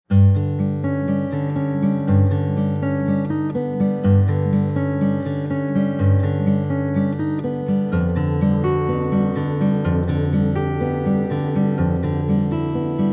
Walking Music

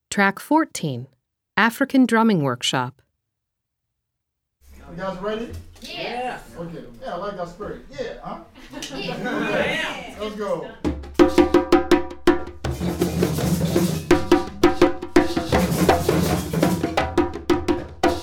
African Drumming Workshop; Blind Youth Audio Project 2018

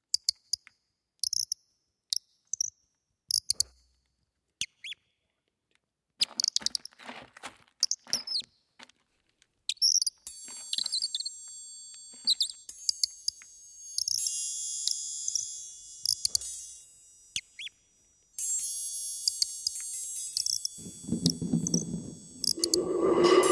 Soundscape; Gallery Workshop

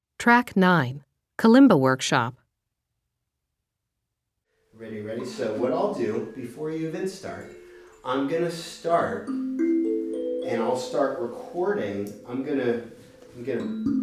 Kalimba Workshop; Blind Youth Audio Project 2018

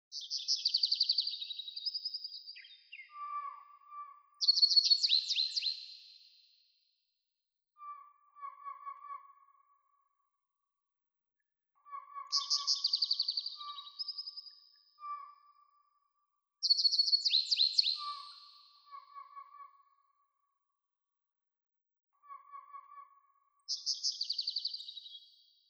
birds; jasper; Feldman; Jeff Roberts

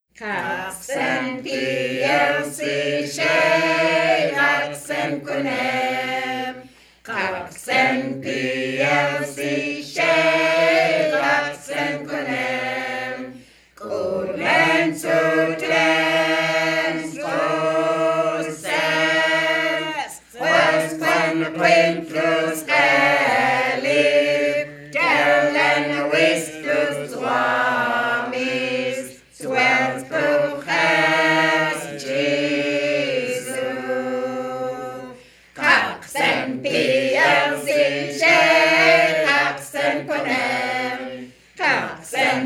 Shake Hands Song

